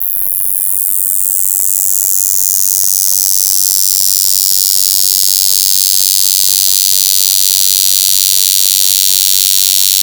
LFO Impact, Analog; Industrial; LFO FX

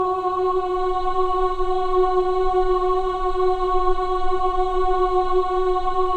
men "ah", choir of ahs; Roland - LA Composer vol. 1

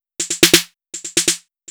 Fill, Drums, Eclectic Electronica

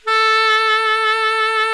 TENOR SAX

